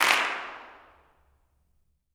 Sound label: CLAPS, Gospel